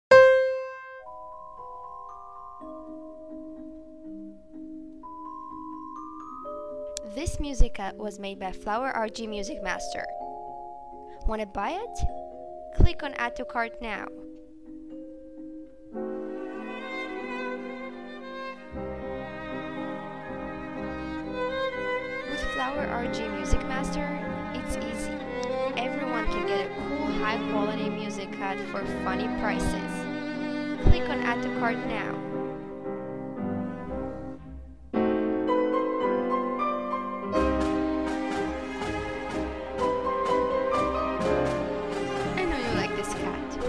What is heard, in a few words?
Tango, music rhythmic gymnastics